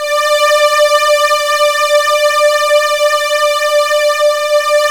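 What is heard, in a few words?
PAD, synth; AMG - Now CD-ROM (Roland); Old Gold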